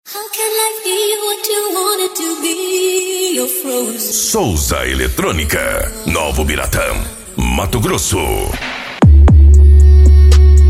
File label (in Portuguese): edicao automotivo; eletronica souza